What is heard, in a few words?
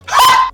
honda accord